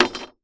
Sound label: Industry Machete Drop On Plywood